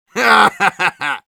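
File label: laugh evil, Russian; Medic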